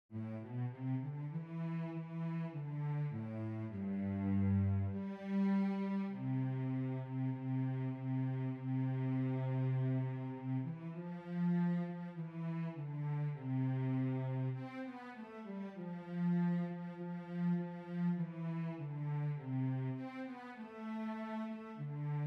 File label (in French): VIOLONCELLE